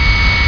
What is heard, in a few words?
DRILL; JOHNNY